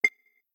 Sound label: Click